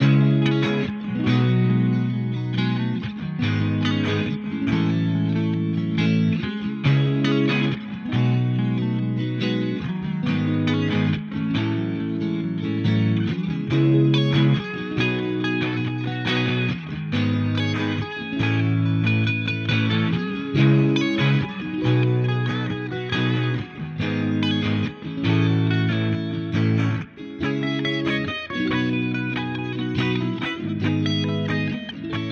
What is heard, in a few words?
140 BPM E Maj, Guitar Loops; Quiet Nights; Cymatics; Journey Guitar Loops